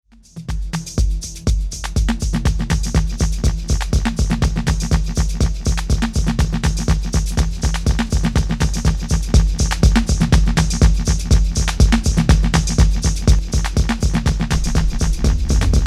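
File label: 400 MS Dry vs. Mix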